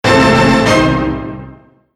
shock

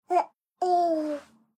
baby laughing

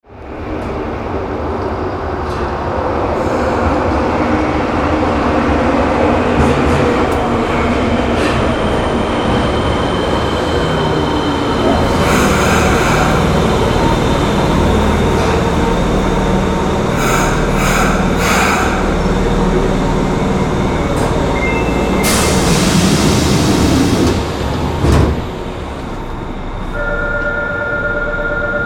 subway

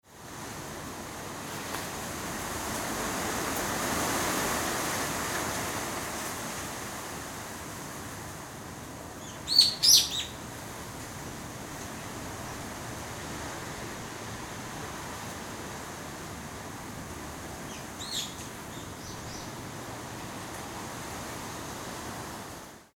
wind

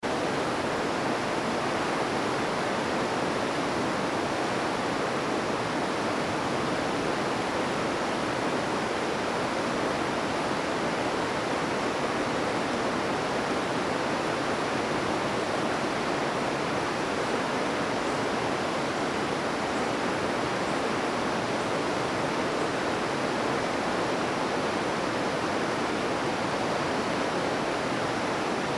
river

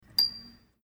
craftsmanship

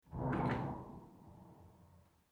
sliding door

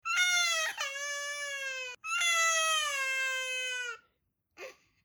baby crying

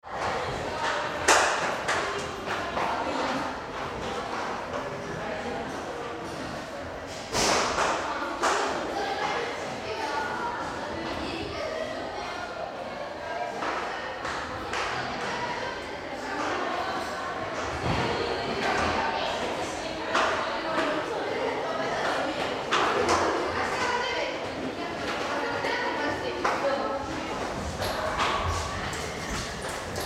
school hallway

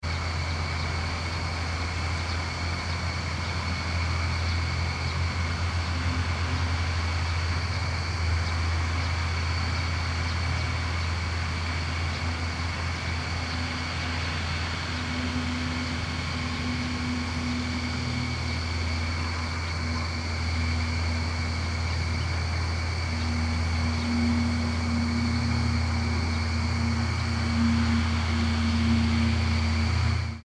countryside

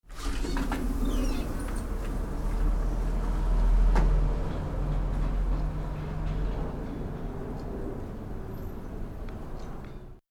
sash door

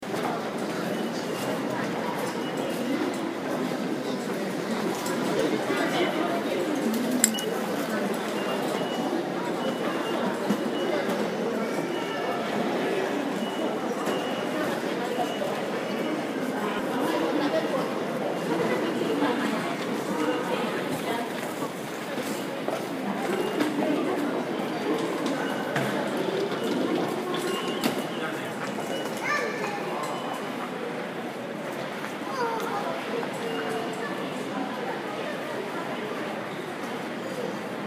station ticket gate

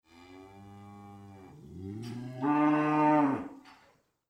cow

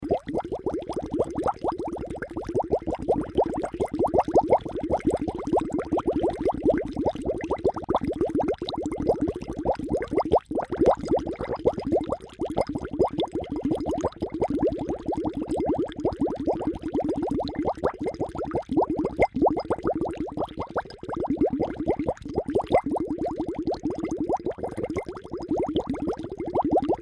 water bubble

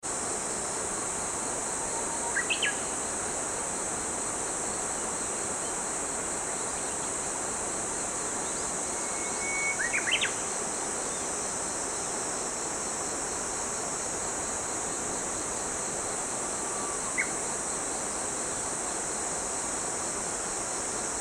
morning countryside

